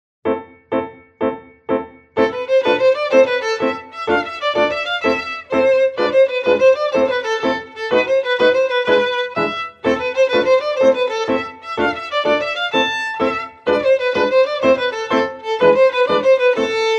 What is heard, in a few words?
Tarantella